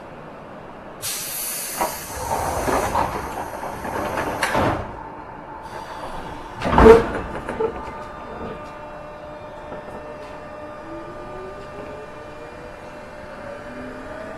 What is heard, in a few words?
joban local chiyoda 203, train